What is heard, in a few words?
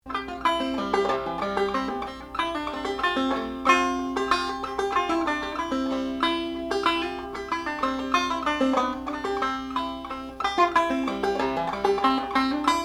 banjo